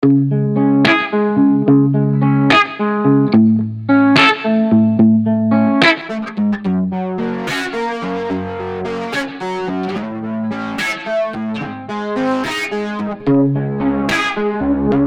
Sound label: on guitar